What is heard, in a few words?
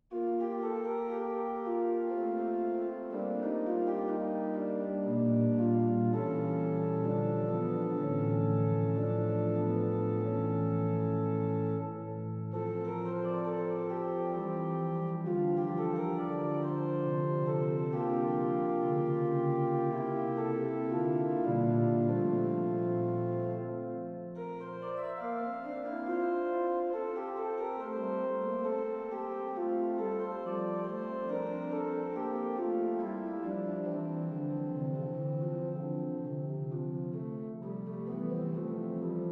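classique, organist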